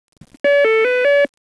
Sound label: ring pattern